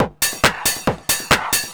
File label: drum loops, Techno, 138; xtra-drumloops